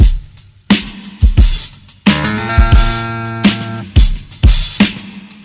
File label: organ intrusion, Boombap Drum loops